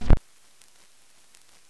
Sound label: Stylus Down, Vinyl Noise; noise hiss crackle